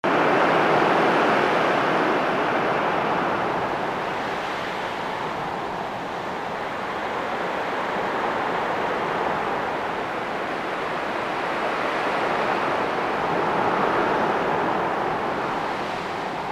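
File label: Wind Breeze Calm Summit Loop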